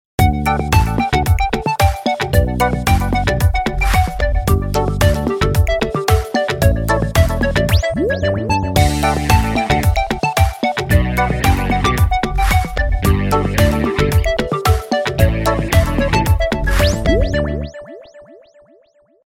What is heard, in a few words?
happy